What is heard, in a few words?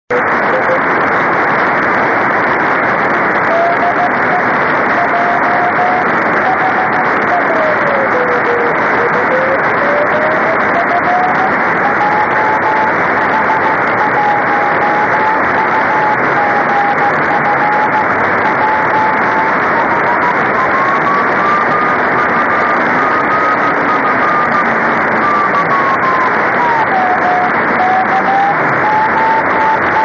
24G beacon; 晴れ、気温２０℃ 湿度５５％; JH1UGF→ＪＲ川崎駅ビル反射→回折→JN1AYV; JH1UGF 横浜市; JN1AYV 茅ヶ崎市